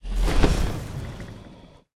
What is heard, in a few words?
wings, enderdragon; mob; Minecraft; Votive World